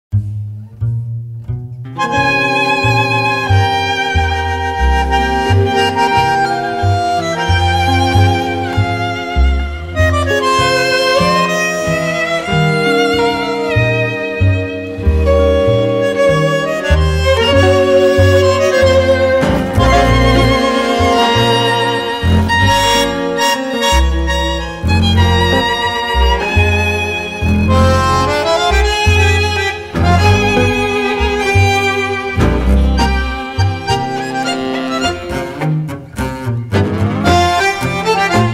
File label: tango Music